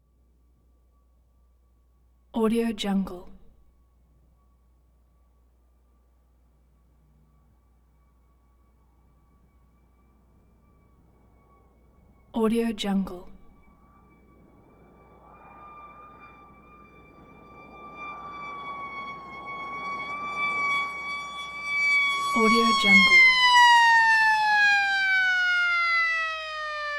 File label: old hand crank siren approach in stop die down